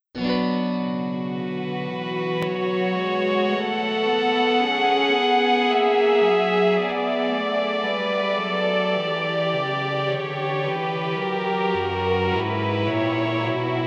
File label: Novachord; BACH